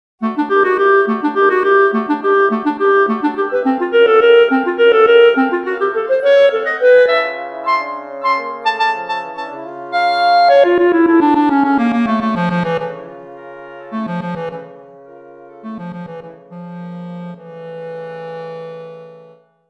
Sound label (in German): Klarinette